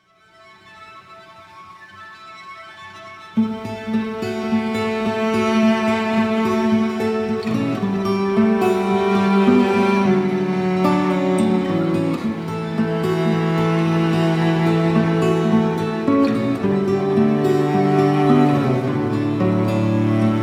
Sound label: Acoustic